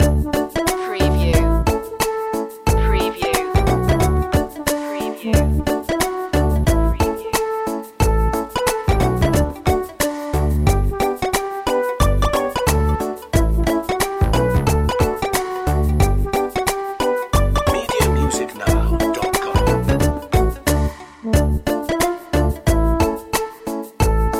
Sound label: retro video game